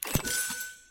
Ping; Apex Legends

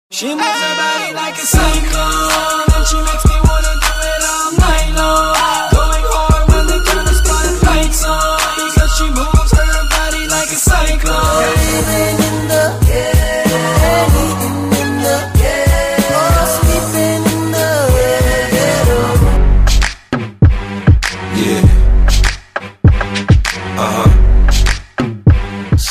Ghetto Mix

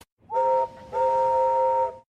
Whistle

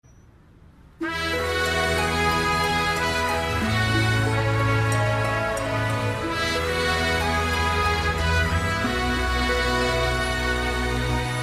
Bgm

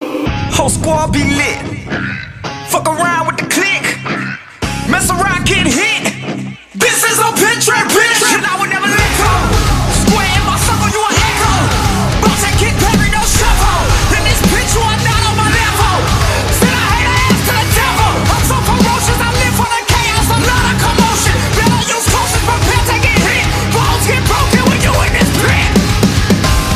trap metal

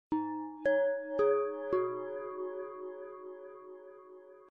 Bell gong

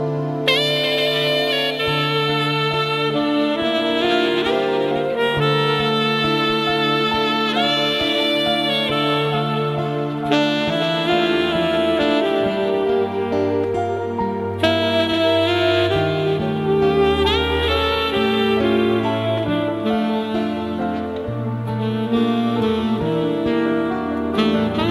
Nice Sax

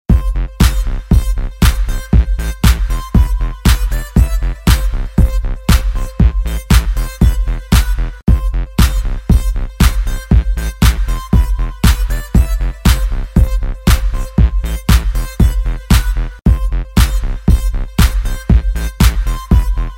Bouncing Tones

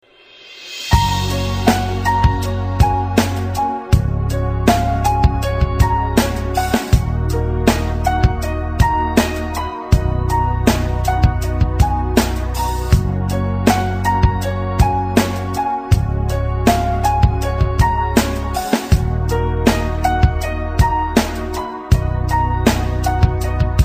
Sad Beat

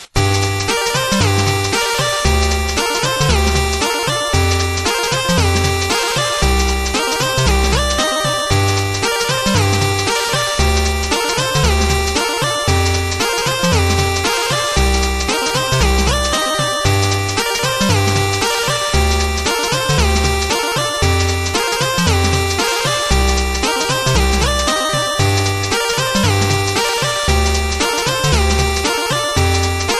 Dubslide

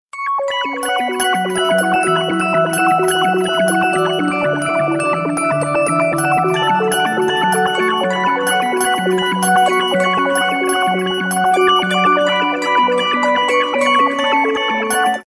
Dolphin Ringtone

